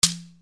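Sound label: percussion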